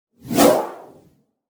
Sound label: Text Message Woosh; SoundsCrate